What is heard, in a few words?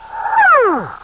STREAK; Millennium Gold 2000